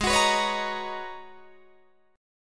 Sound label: G sharp Minor 11th G sharp Guitar DADGAD, chord